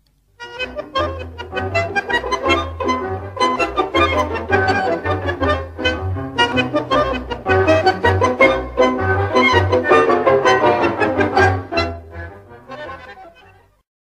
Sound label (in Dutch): Accordeon